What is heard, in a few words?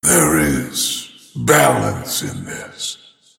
Deny pain; Void Spirit